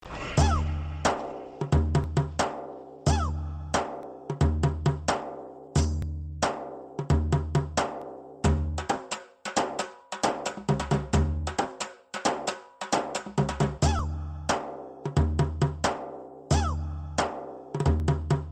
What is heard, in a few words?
mass beat ringtone